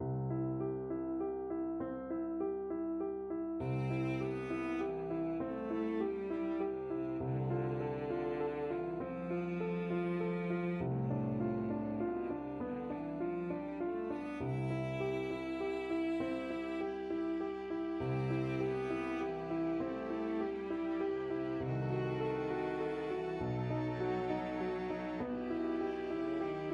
swan, piano quartet

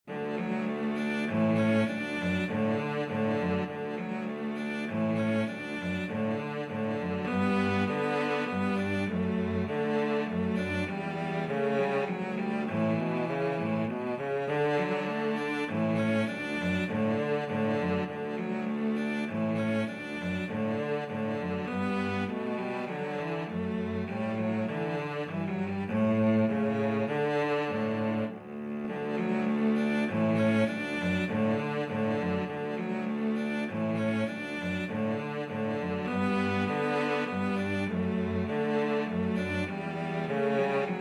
minuet, cello duet

